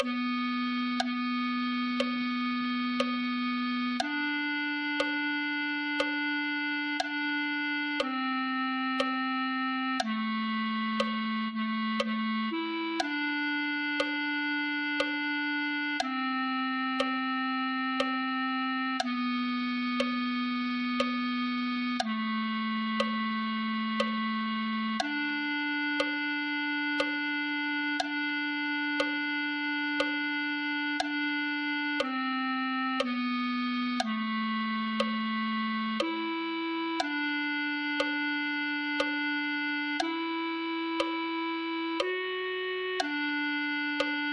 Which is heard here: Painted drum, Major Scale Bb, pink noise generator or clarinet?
clarinet